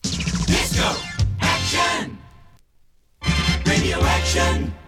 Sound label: topformat maxi jingle single